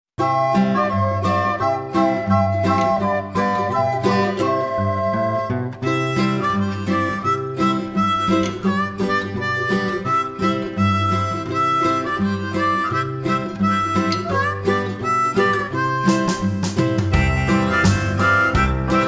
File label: Mary Had A Little Lamb instrumental